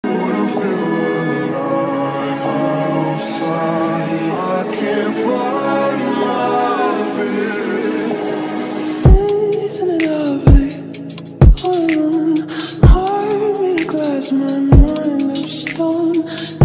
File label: lofi remix english ringtone